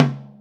gretsch tom, deep house single hits

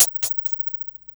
RB 92 HH FX -R, Hip Hop R_n B; USB Soundscan vol.19; AKAI

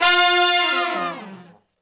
Long Falloff (F) unison, Horncraft; Sections

